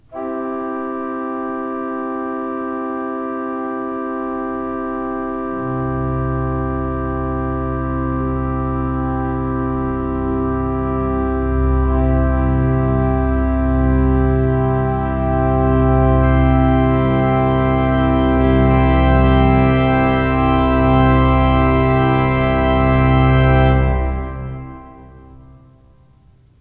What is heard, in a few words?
crescendo loft, pipe organs